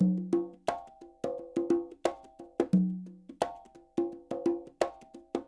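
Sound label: shuffle, percussion loops 88; Sampleheads; NYC Percussionworks; SF LOOPS 88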